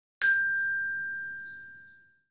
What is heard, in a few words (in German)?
glockenspiel (c-dur-tonleiter) pro ton ca. 3 sec.; geraeusche digital